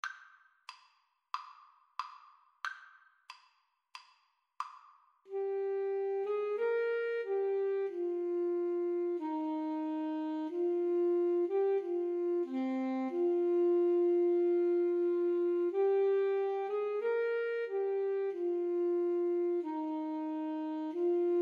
Alto Tenor Sax Duet

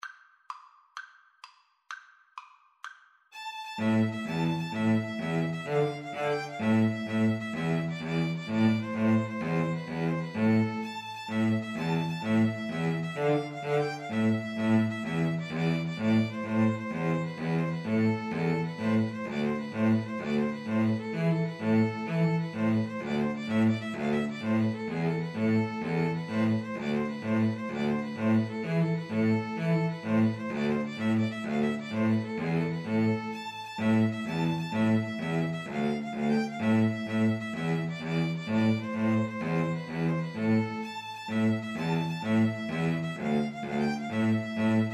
tarantella, 2 Violins Cello

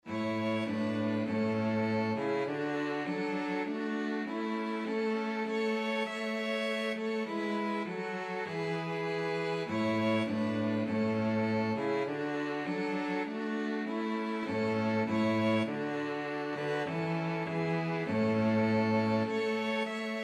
2 violins cello

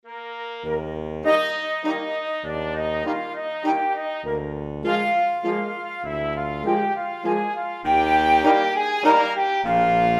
german dance, flexible mixed ensemble 5 players

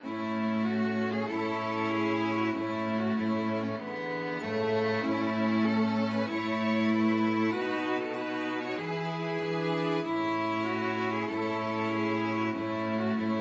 STRINGS, string quartet; Hail to the Chief

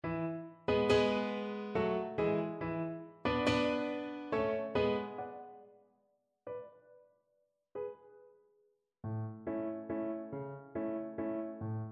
viennese blood waltz, cello

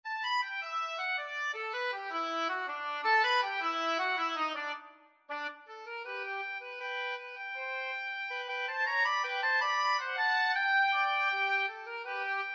oboe duet

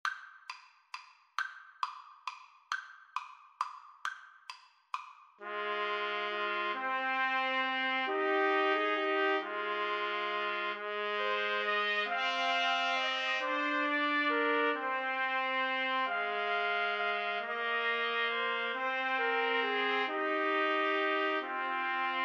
scarborough fair, Trumpet Trio

